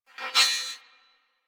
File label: Beep Comby; Warframe; Ambience; Corpus; Beeps